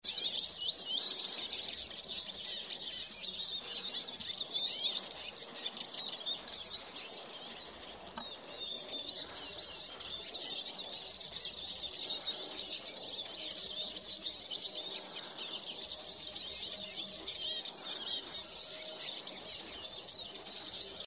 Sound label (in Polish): czyż, ptaki